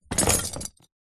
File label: horse saddle leather metal set down jangle